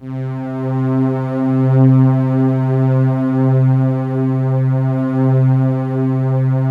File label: PAD C3; USB Soundscan vol.05 - Explosive Jungle; AKAI